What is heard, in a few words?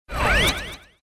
candy throw; tak bites